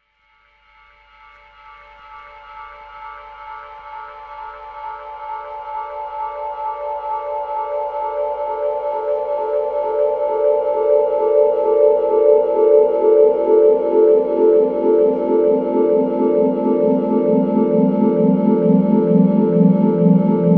Pad; Jungle; Pads; Blu Mar Ten; Jungle - 1989 to 1999

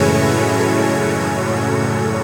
Portraits Pad - 11A; Jungle; Pads; Blu Mar Ten; Jungle - 1989 to 1999

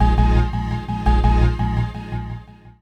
Synths; Jungle; Riffs, Arps & Hits; Blu Mar Ten - Jungle Jungle - 1989 to 1999 Samplepack

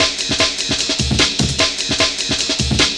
Drumz; Jungle; Breaks; Blu Mar Ten - Jungle Jungle - 1989 to 1999 Samplepack

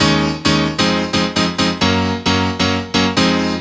Awesome Piano, 3B; Jungle; Blu Mar Ten - Jungle Jungle - 1989 to 1999 Samplepack

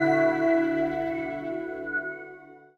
Beep Sine; Jungle; FX; Blu Mar Ten - Jungle Jungle - 1989 to 1999 Samplepack

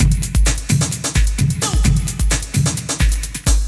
Control Vocal Break, 1A, Jungle Breaks; Blu Mar Ten - Jungle Jungle - 1989 to 1999 Samplepack

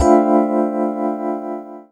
Jazzy Chord - 7A, Jungle; Blu Mar Ten - Jungle Jungle - 1989 to 1999 Samplepack

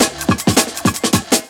Ten Break; Jungle; Breaks; Blu Mar Ten - Jungle Jungle - 1989 to 1999 Samplepack

